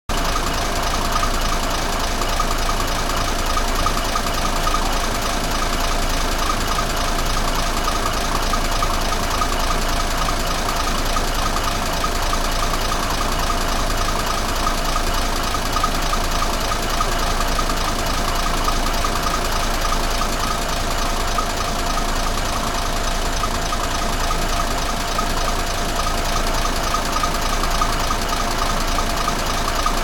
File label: kiha52 idle, rail